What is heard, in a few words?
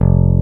BASSES; Kid Nepro - Elite